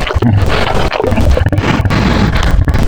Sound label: Pig, Animals Wavetables; Cymatics; Serum; Animals for Serum - Gold Edition